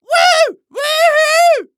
Human Male Cheering Woo Woo Hoo

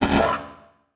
Transition Hit Impact Distorted; Adobe; Production Element

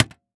Impact Metal Impact Metal Garbage Can Lid Close; Impacts

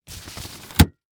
Sports Umbrella Golf Umbrella Open

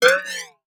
Multimedia Internet CD-Rom Flash Animation

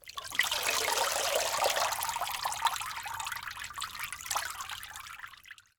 Liquid Water Water Drips

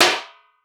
Impact Trash Can Lid Hit By Plastic Tube

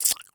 Human Male Sucks On Teeth

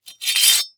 Sword Pick Up From Stone, weapons; AdobeCC

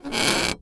Wood Creak Floor, Household

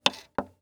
Electronic CD Player Press Button

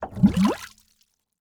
Liquid Water Water Bubbling In Plastic Tub

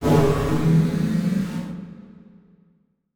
Transition Whoosh Drone; Production Element; Title Transition; AdobeCC

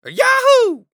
Human Male Yahoo

